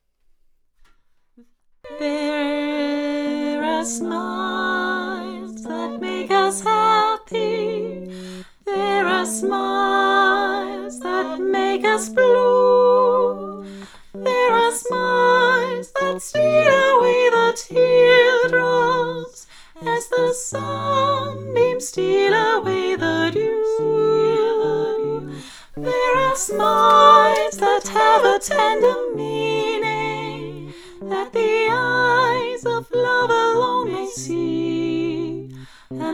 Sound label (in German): Sopran 1 und 2 lauter, Singalongs